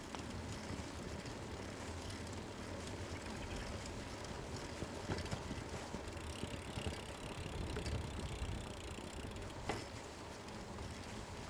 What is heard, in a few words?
Bike